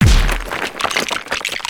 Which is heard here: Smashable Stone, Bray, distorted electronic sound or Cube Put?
Smashable Stone